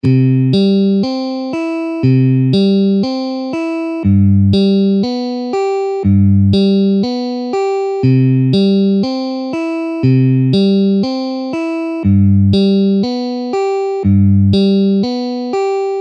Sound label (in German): Zupfmuster; pfadfinden